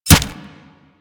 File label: Kunai Impact Flesh; Warframe; Hit Effects